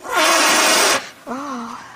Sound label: sniff ahh; ooh ahh; human